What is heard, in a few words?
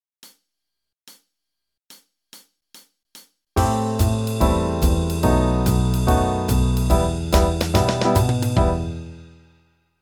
Backing Track E minor 144 bpm; Original Key